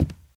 carpet step; Golden Crayon Sounds

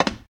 glass step; Golden Crayon Sounds

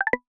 Start Gaze Exploration, mono; iOS 26 Sounds

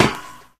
ladder land; Golden Crayon Sounds

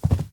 carpet land; Original TK Sounds

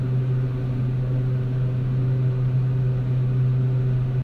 elevator loop; Golden Crayon Sounds

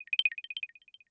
Locator, AirPods Pro 2 Case Sounds

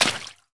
water step; Golden Crayon Sounds

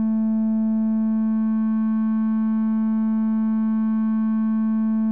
bass Tone; iOS Sounds

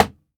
wall building; Golden Crayon Sounds

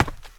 wood step; Golden Crayon Sounds

